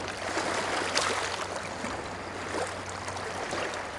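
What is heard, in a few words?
Ambience Golf Crowd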